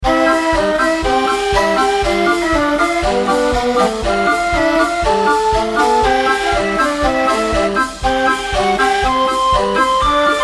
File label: carousel dusk, music